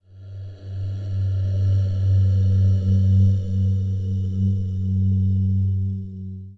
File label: Voices